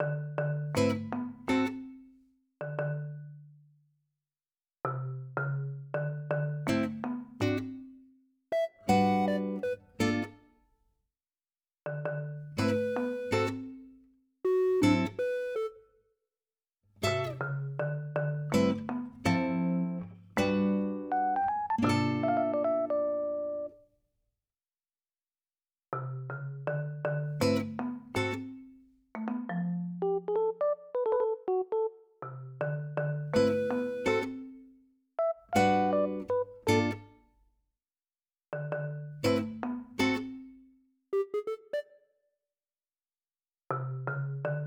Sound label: Rainy